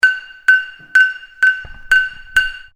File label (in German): Pfannen hauen